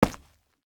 Foley Footsteps Rocks Sneaker Jump Land On Two Feet Close